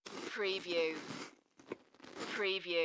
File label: Chew Crackers Mouth